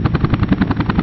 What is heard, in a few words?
CHOPPER, rotor